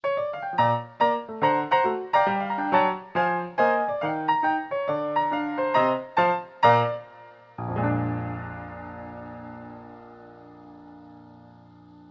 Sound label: Vintage Grand Untuned Tape